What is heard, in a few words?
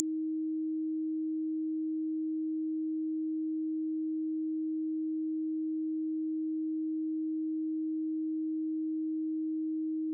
315Hz -30dB